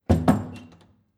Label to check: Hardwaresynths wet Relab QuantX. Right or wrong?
Wrong